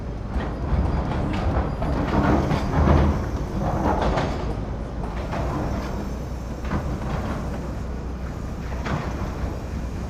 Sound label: Urban day construction; urban and suburban; boom library